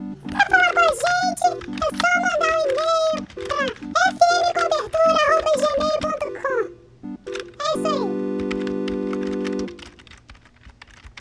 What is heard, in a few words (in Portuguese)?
vinheta